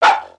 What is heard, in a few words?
Pet Dog Small Alert; creatures; pets; Lego